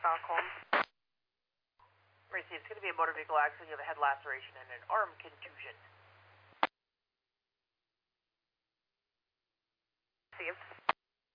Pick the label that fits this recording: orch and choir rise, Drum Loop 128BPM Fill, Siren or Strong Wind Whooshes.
Siren